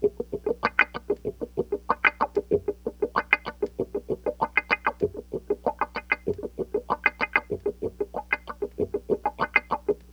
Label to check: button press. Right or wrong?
Wrong